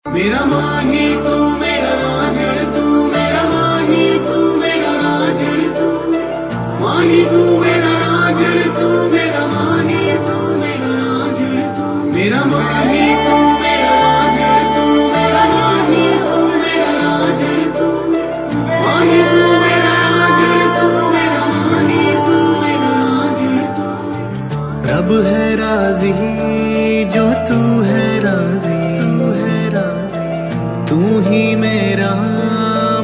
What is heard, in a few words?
Male; TV Serial Tone; Life OK